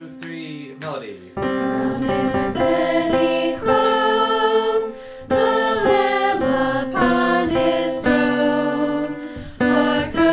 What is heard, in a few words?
ALTO, choir; Crown Him